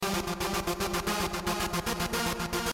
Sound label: jazz